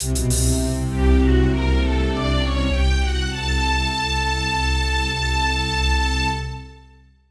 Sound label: Jingle Bad; Azito 3D; 3DS